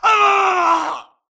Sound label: grunt electro; Titanfall 2